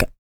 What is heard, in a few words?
HIT, Jazz FX FEM PERC; Spectrasonics; Vocal Planet